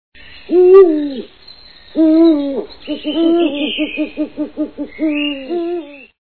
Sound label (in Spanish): buho real; fauna